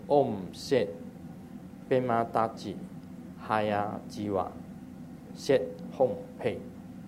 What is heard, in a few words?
Hayagriva Mantra